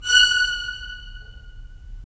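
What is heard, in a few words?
strings violins; Roland - LA Composer vol. 4; 90 s Sample CDs; Violins Sh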